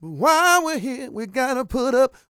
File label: SING, Vocal Planet R&B & Dance; ILIO